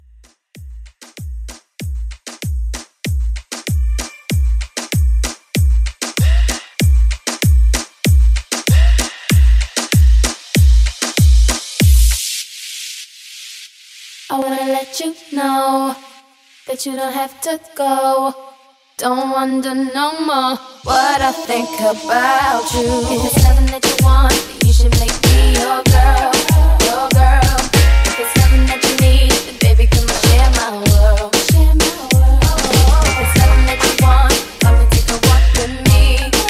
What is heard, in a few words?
Pop Moombah 96 BPM